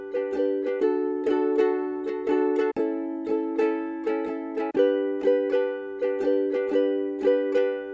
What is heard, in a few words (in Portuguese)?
Ukulele Loops